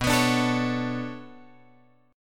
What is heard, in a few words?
B Minor 9th B; Bm9 Chord {7 X 9 7 10 9} strummed